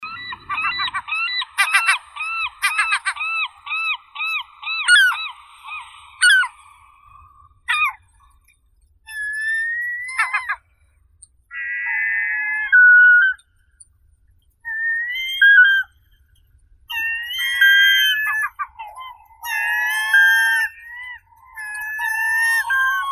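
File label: glaucous-winged gull, larus glaucescens; chaika serokrilaya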